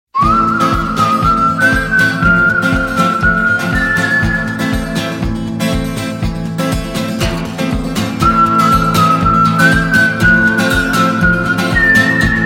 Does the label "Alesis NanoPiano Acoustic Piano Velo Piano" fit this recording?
No